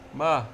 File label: tone